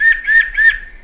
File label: birds karelia; ambient; World of Tanks Blitz